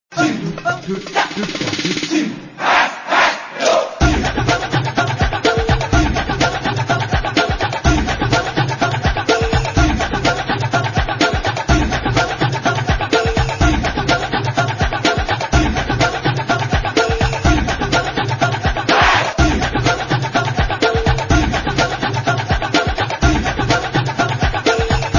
kecak

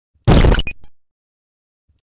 slap

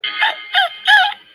whimpering; rubble

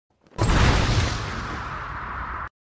normal punch

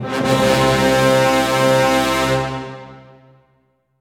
solid brass

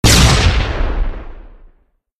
tnt explosion

